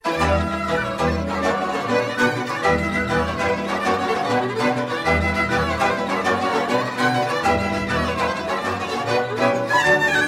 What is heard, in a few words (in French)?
Briul Batranesc - Roumanie